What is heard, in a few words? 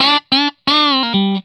RIFF 120, Vintage Blues Guitar